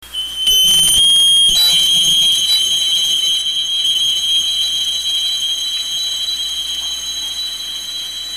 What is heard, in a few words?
sqs26 hammering away